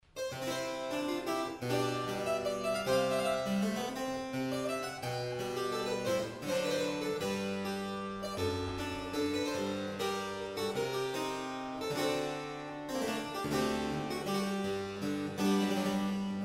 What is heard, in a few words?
Suite Courante; Froberger; Suite 12